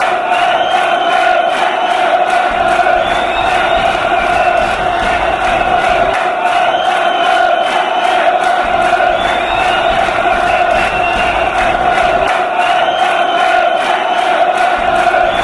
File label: antwaarp fanchants